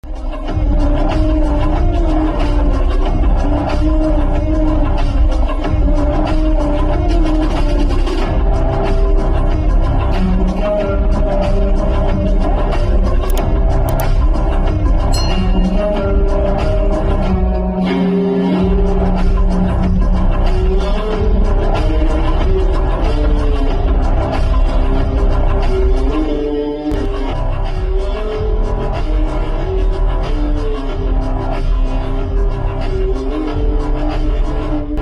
edm bgm; Guntur Kaaram; Mahesh Babu

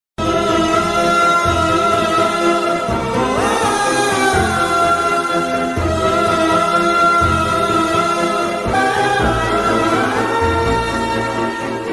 bgm music

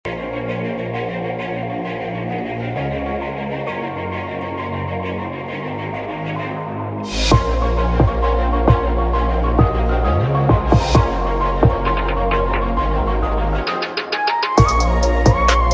telephone bgm mix; thiruchitrambalam

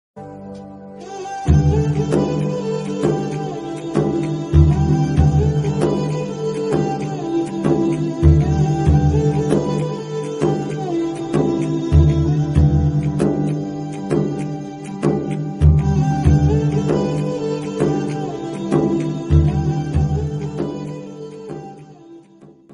movie bgm; Kotha Bangaru Lokam

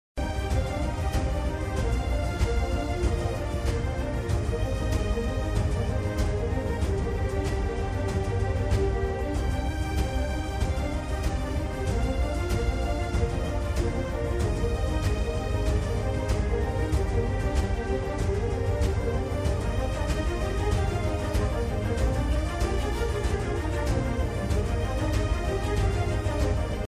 interval bgm ringtone telugu bgm ringtones; adbutham

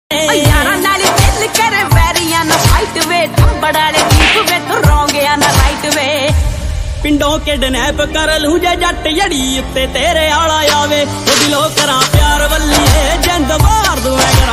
punjabi song ringtone

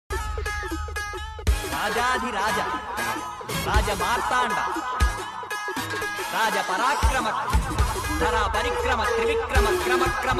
interval bgm ringtone; raja raja chora

